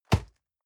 box hit hard dry

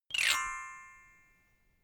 notification glockenspiel slide down hard

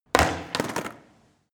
skateboard indoors drop

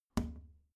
plastic trash can close soft

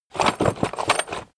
tool draw

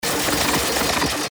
battle laser

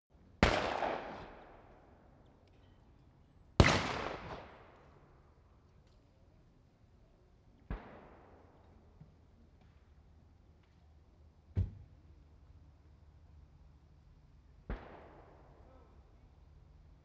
gun shooting range

